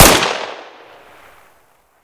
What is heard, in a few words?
fire 3p; kp31; tacz; RFP v1.0.0 beta2